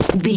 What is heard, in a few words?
footstep gravel land